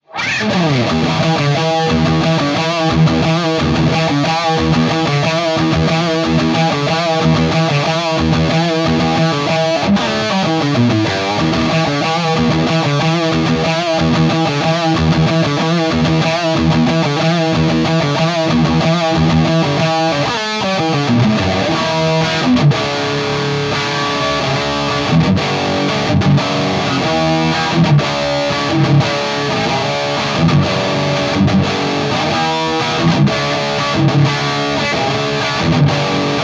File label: Rock Riff